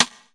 springverb rimshot; Snares

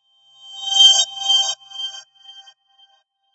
reverse bell crash; Bells

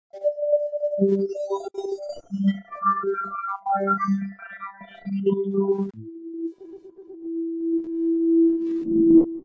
shortwave harmonic tuning; Various Strange Sounds and Effects

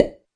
pop; Weird and Interesting Hits

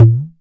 ROLAND SPD-20 PERC TABLA

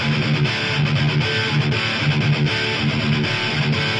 metal guitar rhythm; SYNTH AND ELECTRONIC LOOPS

